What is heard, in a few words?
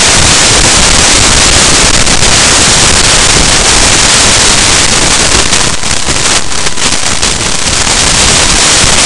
19268Hz